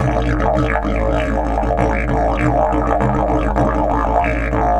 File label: DIDGE 100BPM; Big Fish Audio - Didgeridoo and Other Primitive Instruments